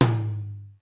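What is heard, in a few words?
mid flat room, tom; sr16 tom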